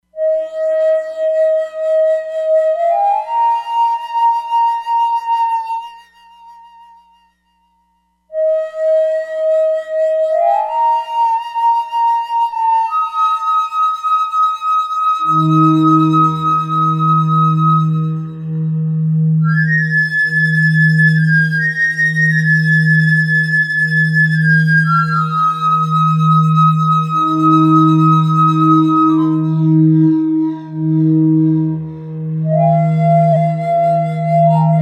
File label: Suling, E-mu Proteus-3; Factory patches